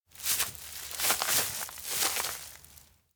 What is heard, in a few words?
steps outdoor dry leaves back step; anemoi